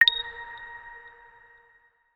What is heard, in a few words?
Ding